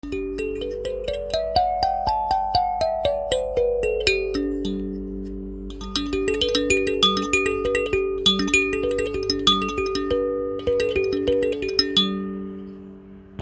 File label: B11 kalimba, kalimba hokema; pengetos hangszerek; melody